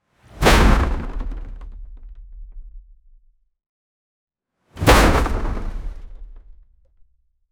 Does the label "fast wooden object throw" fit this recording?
Yes